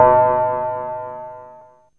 ANALOG BELL; ANALOG BELLS; Ambient; Zero-G; Ambient 1

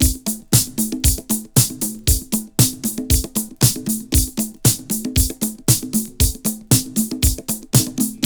116, LOOPS; Best Service; Hyper Dance; 90 s Sample CDs

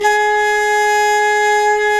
WND FLT MT, Flute & Mute

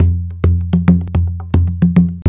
110 JNKNO, Junkanoo; Spectrasonics - Supreme Beats - World Dance

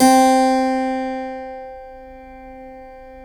C 2 CLAV; USB Soundscan vol.17

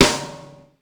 Jam Snare, Ensoniq ASR-X Pro

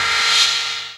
D+B

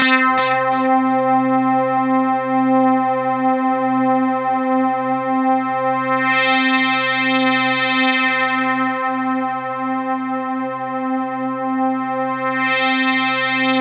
C3, Synth Line; 90 s Sample CDs; NiceBeats; SynthLine VOL-1

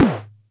Music Percussion Computer Tom, Drum Machines

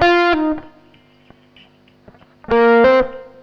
CLEAN, CLEAN GTR

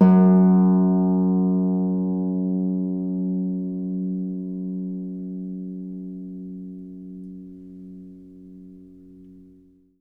Harp F#2; Miroslav Vitous - Percussion & Harp

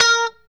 POP A#4, STRAT, Guitars; Spectrasonics; Hans Zimmer Guitars VOL-1; JC-STRAT POP

